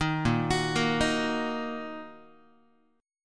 Bb Suspended 4th Sharp 5th Bb down Guitar; Bbsus4#5 arpeggiated; 6,6,9,8,7,x

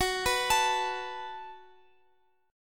F sharp Minor Double Flat 5th, Guitar, Open F; F#mbb5 arpeggiated; chord shape; x,x,x,13,14,16

